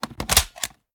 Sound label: sm uzulu reload mag in; gmod; iw8